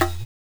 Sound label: African Perc, Genesis; The Lion King; M8